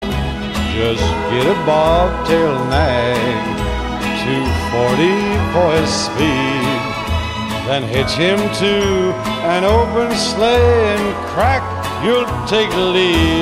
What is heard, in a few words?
Jingle Bells